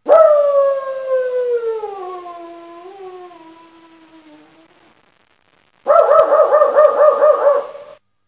voi odinochnii shumnii dolgii s laem

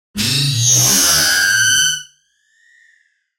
avtomobil budushego rezkij blizkij aktivnij

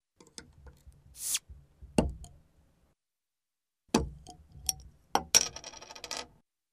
snimayut krishku s butilki gazirovki krishka padaet na stol

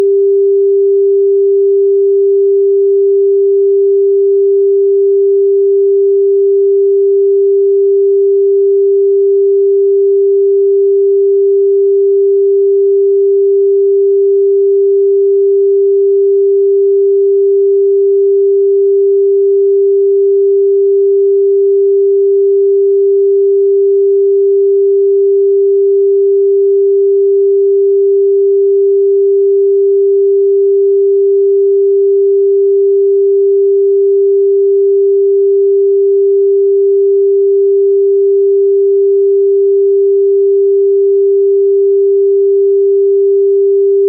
zvukovaya chastota chakri 396 gc kornevaya

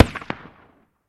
shutochnij vistrel iz igrushechnogo pistoleta

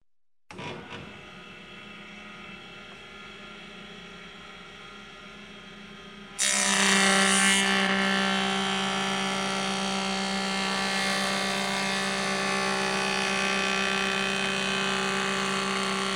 cirkulyarnij rezak vrashenie frezi otrezanie materiala

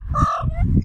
kot mirlikaet

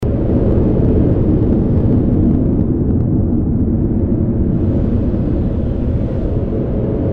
veter odinochnii shumnii blizkii

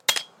legkij tverdij predmet udaryaetsya o tverduyu poverhnost

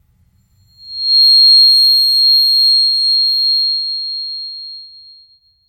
chastota visokogo tona dlya yarkogo sveta

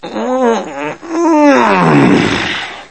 ston muzhskoj probuzhdenie

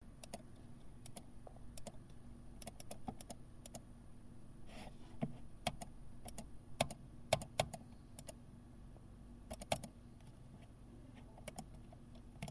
klikayut mishkoj

